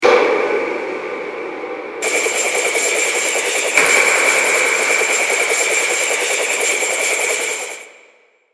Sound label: gun